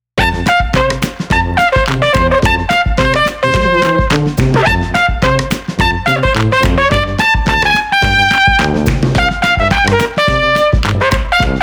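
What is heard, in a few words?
Tuba Trumpet Festive with Percussion 107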